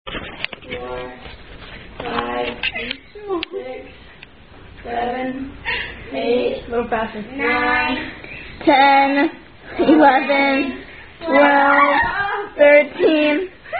Spiny Stick, Dizzy Stick Non-Drinking Game Children's Game; The Spiny Stick